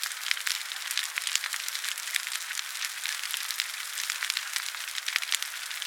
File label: pwr burning lp; smite; zhongkui